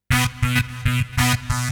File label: ZIPPER, KEYBOARD, HOUS TEC; MAGIX